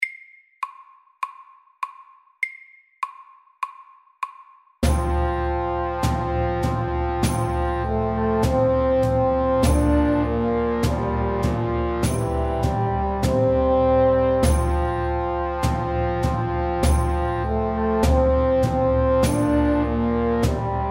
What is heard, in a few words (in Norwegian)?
Engelsk melodi MIDDELS